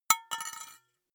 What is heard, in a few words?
glass metal small drop